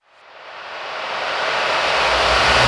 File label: Sweeps Medium